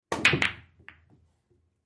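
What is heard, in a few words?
Pool Game Billiard Balls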